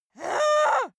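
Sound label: female angry, screams; schreie; menschen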